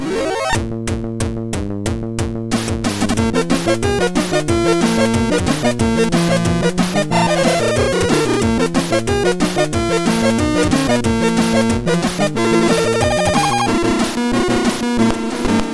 Battle sid MOS6581R4; Final Fantasy 6

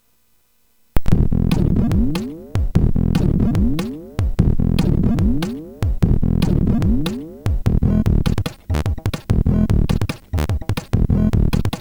Bouncy Funk, MOS6581R4; hvsc

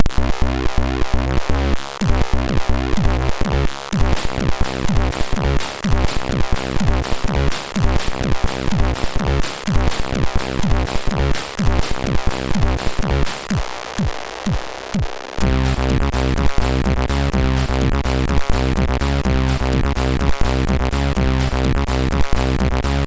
Techno, MOS6581R3

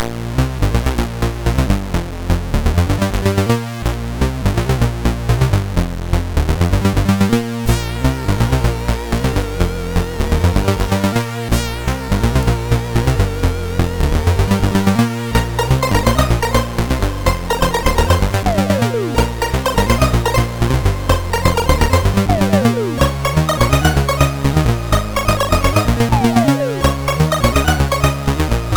Quartet, CSG8580R5

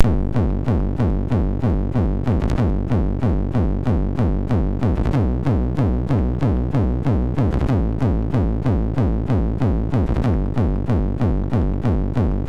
Headbang sid MOS6581R2

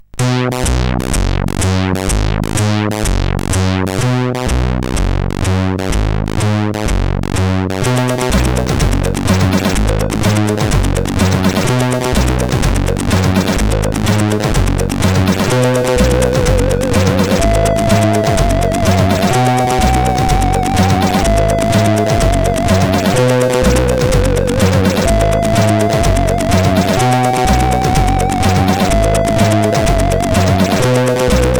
Flute sid CSG8580R5

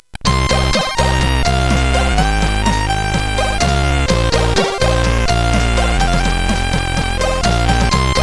Test, CSG8580R5; hvsc

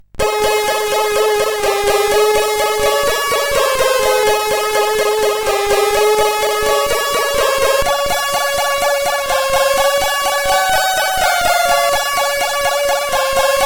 tune, sid 8580R5

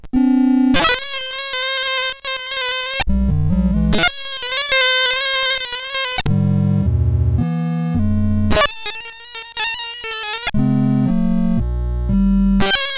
Organ Torture, CSG8580

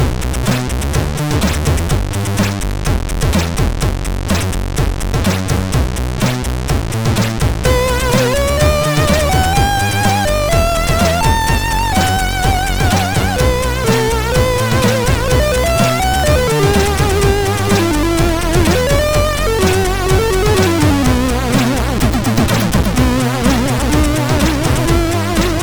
sid MOS6581

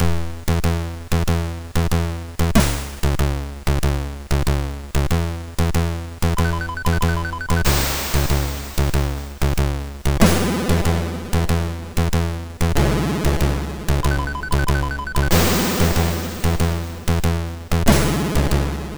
intro sid MOS6581R2; Balloon or Die

